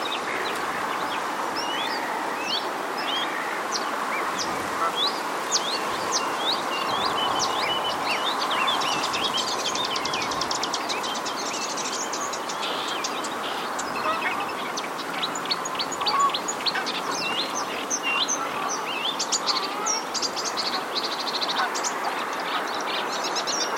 hypolais polyglotte; lieusaint

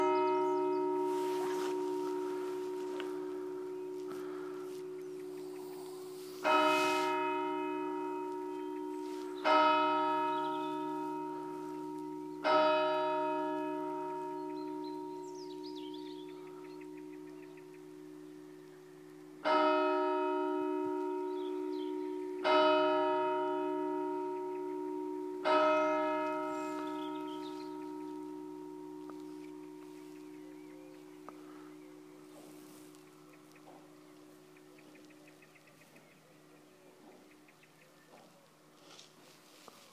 cloches

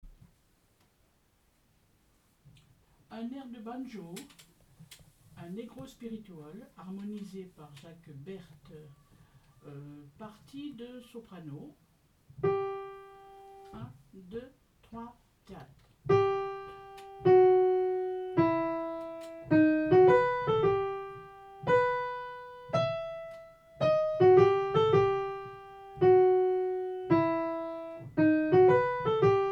air de banjo

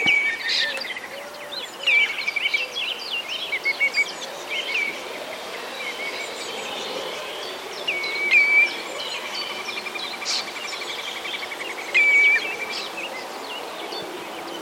chevalier gambette

